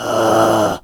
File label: Samurai Attack Vocals